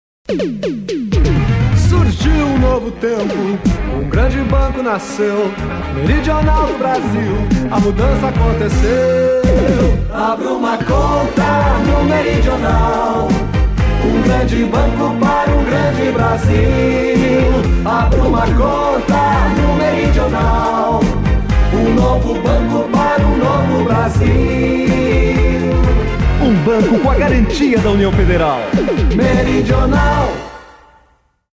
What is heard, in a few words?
Jingle; Meridional; IpanemaFM; Agencia Jinga